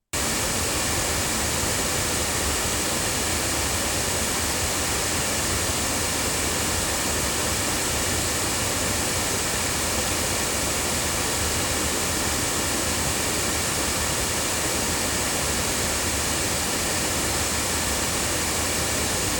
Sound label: Violet Finger loudspeakers, microphones